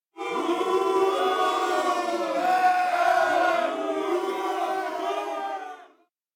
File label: booing; anemoi